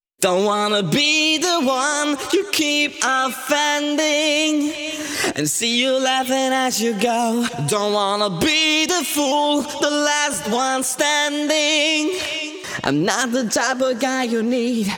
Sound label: Pitched Vocals WET, 128 BPM; Vengeance Samples; Vocal-Hook Kits; Song Kit